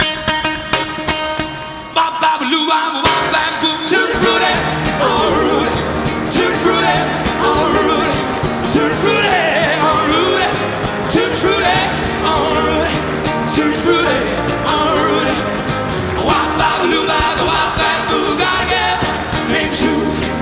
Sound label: rock